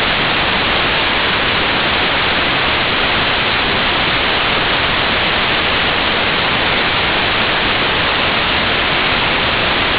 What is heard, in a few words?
whitenoise